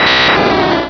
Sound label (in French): Cri